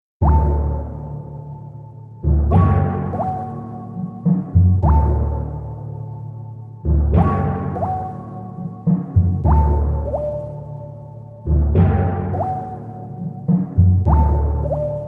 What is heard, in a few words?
Mysterious Rain Drop